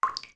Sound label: Beneath Well Droplet; zelda; OOT